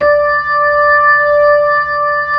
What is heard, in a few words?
B-3, Brite; Keyboards of The 60's and 70's